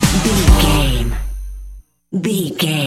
club journey fall sting, Dance, EDM, Club, Eurodance; Exercise; Aerobic; Aerobics; Spinning; Workout; Energetic